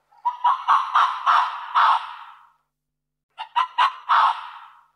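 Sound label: arctic fox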